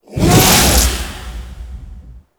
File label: Condemnd Impact; Warframe; Priest